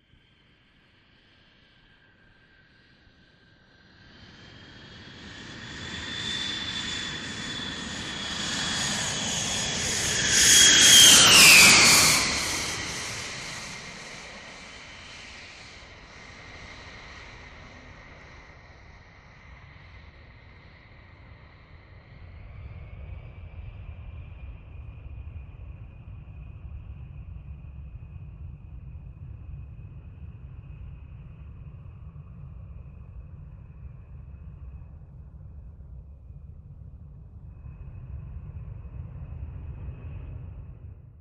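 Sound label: Large Jet Pass Low Land, jets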